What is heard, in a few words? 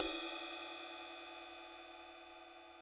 cymbal sound a key